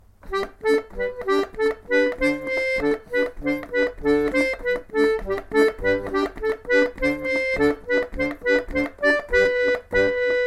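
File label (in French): polka, accordéon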